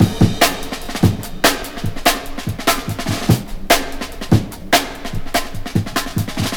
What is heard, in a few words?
146, breaks